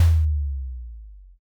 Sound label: odaiko